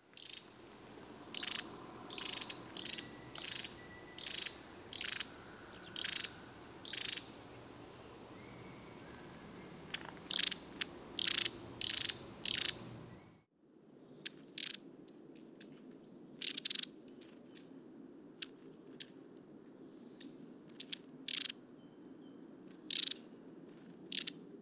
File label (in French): Mésange à longue queue; sonotheque ornithologie